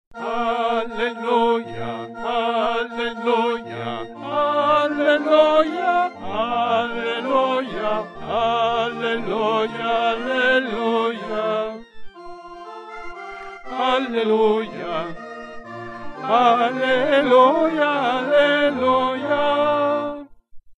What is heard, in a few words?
Alleluja Tenori; Mozart; Rorate Coeli